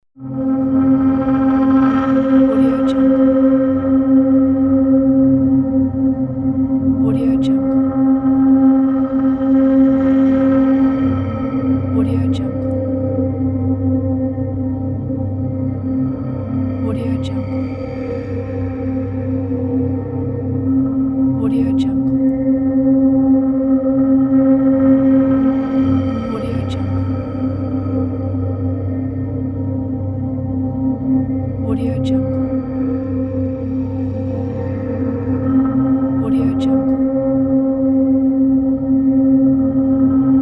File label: drone exploration; applehillstudios